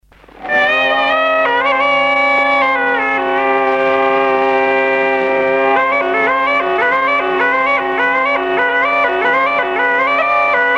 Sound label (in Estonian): Mustlasetants, folk; Kuusalu